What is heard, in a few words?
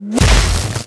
tazor shoot impact shock